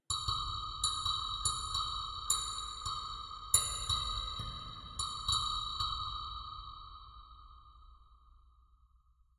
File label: ambient synth grain click